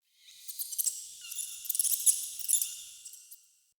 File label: Witch Station Ruins Ghost Rat Chatter; Ambience; Soul Plane